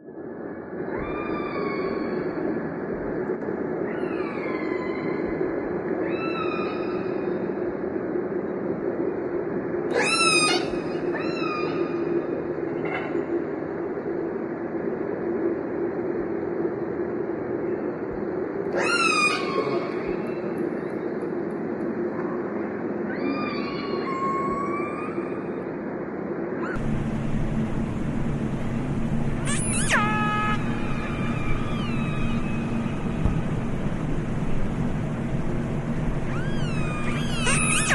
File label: orca whales talking